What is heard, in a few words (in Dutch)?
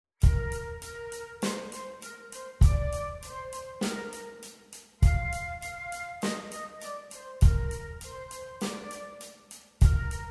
Rock Fluit